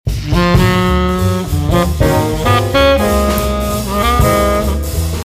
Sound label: Quartet melody